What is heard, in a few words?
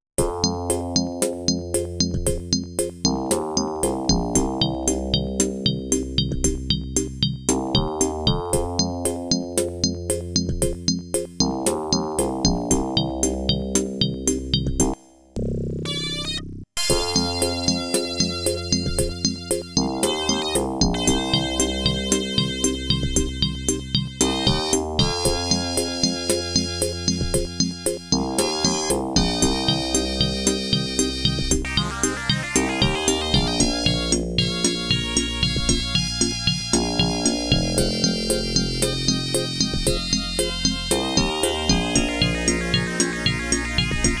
ADLIB OPL2